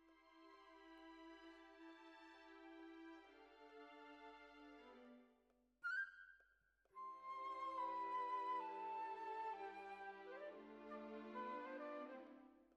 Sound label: Bassoon, Jazz for piano